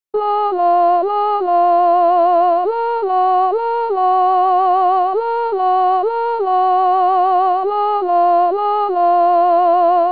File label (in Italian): soprani